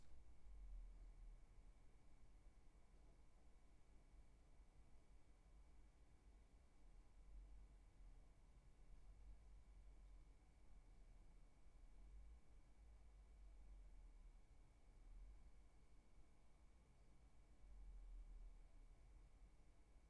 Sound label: room tone